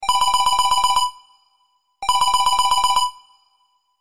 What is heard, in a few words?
Ring